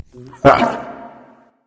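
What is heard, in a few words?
"heh heh", nonverbal; smite; voicepack; khepri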